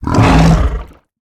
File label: Exertion Mortar; Evolve; Behemoth